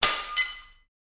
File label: Glass breaking with crashing reverberation; Glass Sound Effects